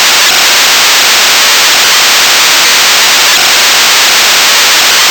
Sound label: noise 1D